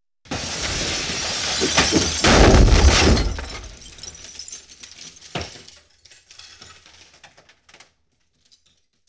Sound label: Explosion; Sony Pictures Sound Effects Series Volumes 1-10; Explosions - 5.1 Surround